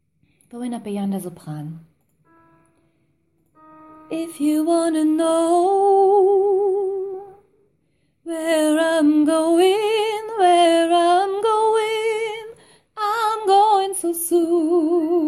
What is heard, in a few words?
sopran, gospelchor